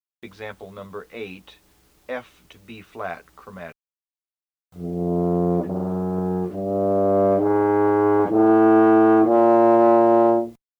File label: Emb TUBA